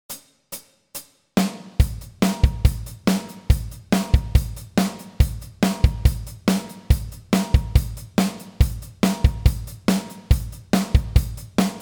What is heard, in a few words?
drums chorus